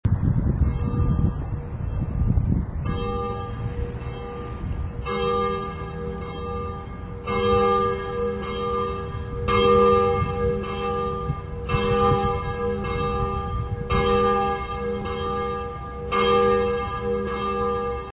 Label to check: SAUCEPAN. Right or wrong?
Wrong